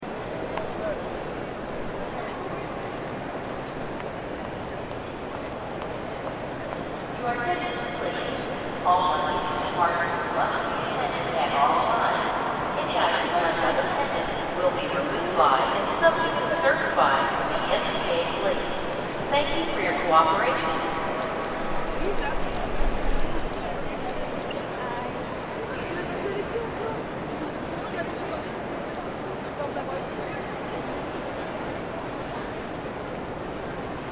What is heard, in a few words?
New York Grand Central Terminal